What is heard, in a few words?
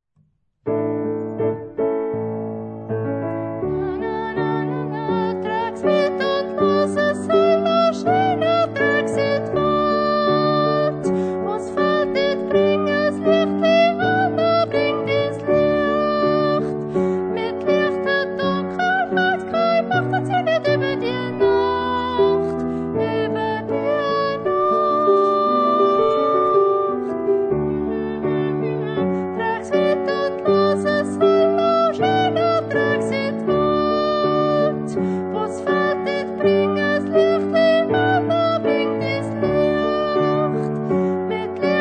Sopran Refrain